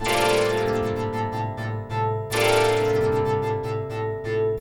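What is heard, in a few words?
RHYTHM PAD, AMBIENT PAD; Trance; AMG - Global Trance Mission VOL-2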